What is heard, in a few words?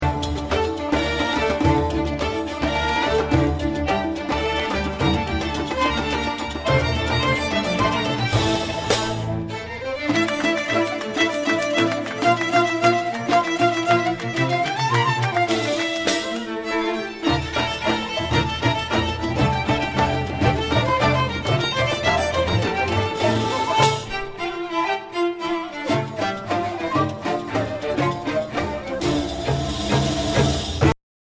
arabian